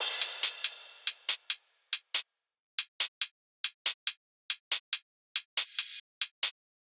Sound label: Riddim Drum Loop 140 Top